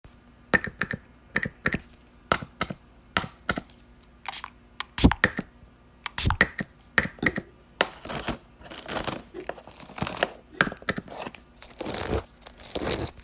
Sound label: Object Combination